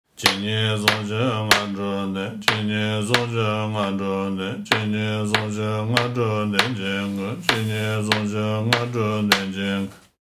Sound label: with drum; Menri lineage of the Yungdrung Bön; how to count: 7 and 9 syllables